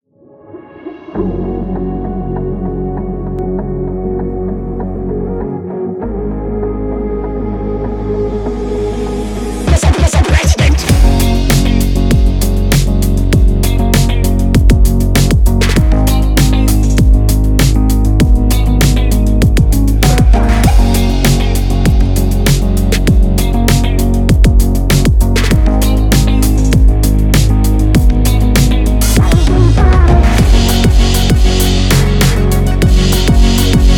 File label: Instrumental